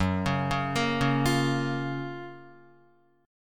Gb Major 7th Gb Guitar; GbM7 arpeggiated; JGuitar

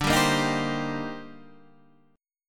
C Minor 7th D; Cm7/D strummed; JGuitar

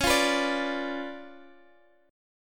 C sharp 7th Flat 9th, C sharp, down

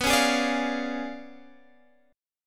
Db 7th Flat 9th

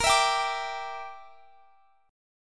A sharp 6th Flat 5th; A#6b5; strummed; x,x,20,21,20,22

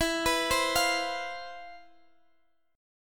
C Major 11th E

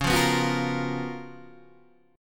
C sharp 13th C sharp, Guitar, DADGAD

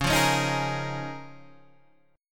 C sharp 6th Add 9th C sharp down Guitar DADGAD

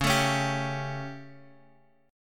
G Suspended 2nd Flat 5th Db

